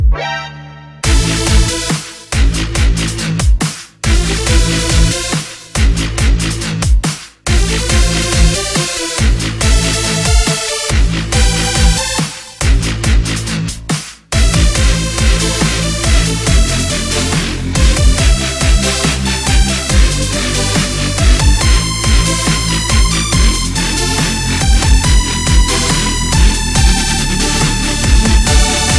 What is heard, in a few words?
dub; mgs